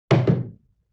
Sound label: Wood Hit